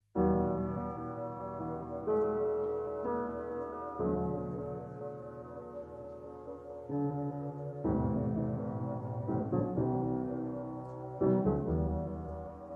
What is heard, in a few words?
slowed down reverb